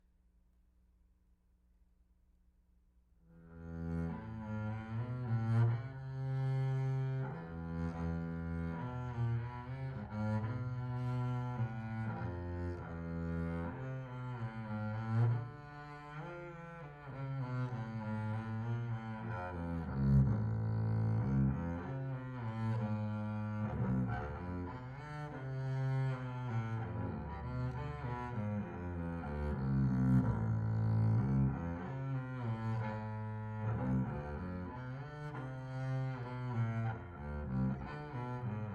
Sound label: Bass Recording